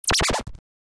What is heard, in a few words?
zap; sorcery